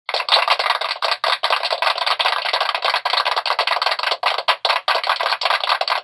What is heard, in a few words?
applause